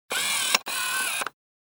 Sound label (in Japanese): kamera